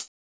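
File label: hi-hat closed, RY30; Giga Samples Collection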